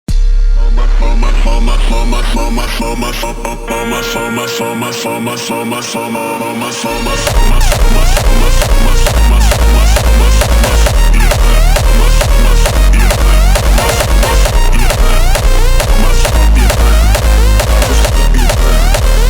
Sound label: Hardstyle remix Slowed